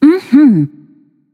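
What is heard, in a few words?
nonverbal agree; smite; voicepack; izanami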